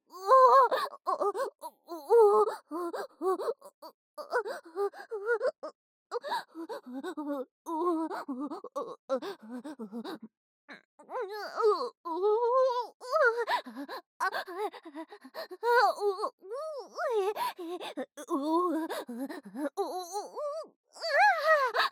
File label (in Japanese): バイブオホ声, ASMR